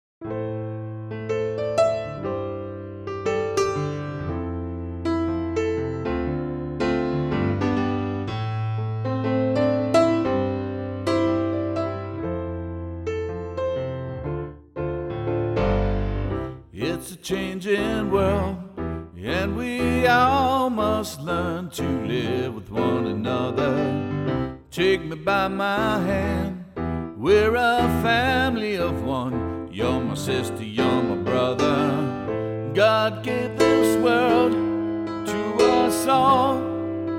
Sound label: VOCALS